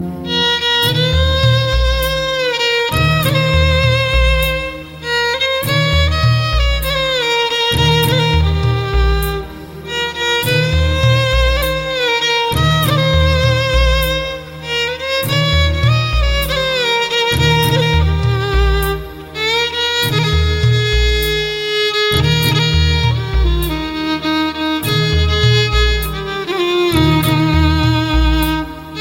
Instrument

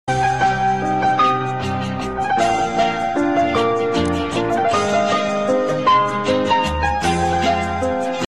Sweet Tone

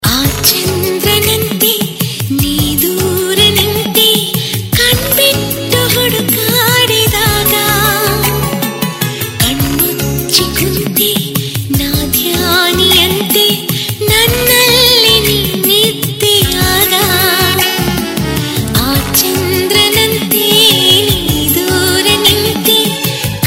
Love Song On Tamil

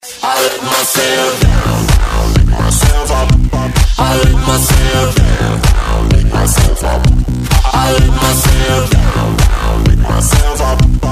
Digital Electro

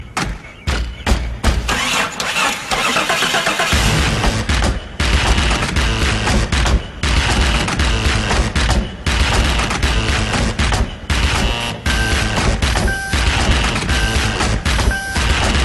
Techno Jeep

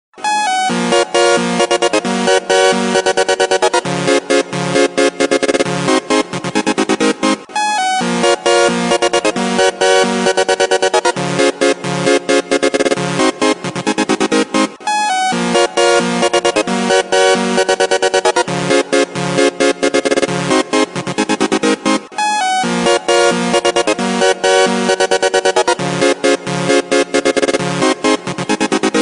New Nokia Mix

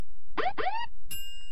Police Siren

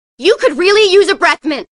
breath Mint

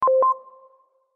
Cute Small Msg

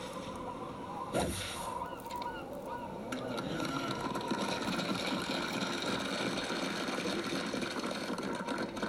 Golf clap

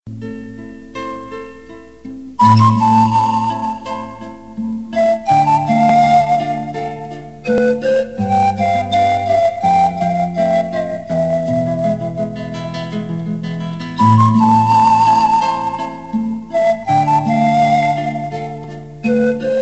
Romantic Flute

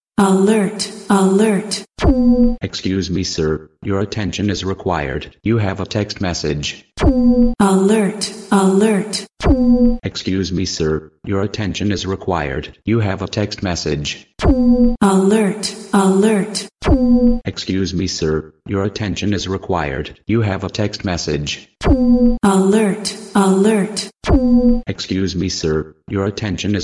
Extended text alert

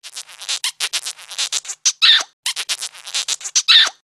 Lovely Kisses Funny

